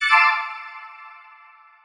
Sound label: Save Overwrite; the tenth line